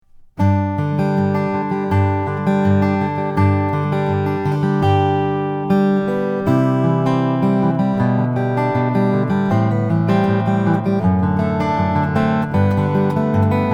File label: rainsong sunburst